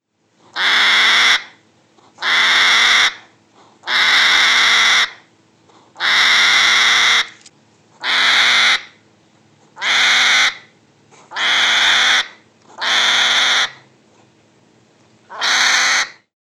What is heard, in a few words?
Shout Of The Hedgehog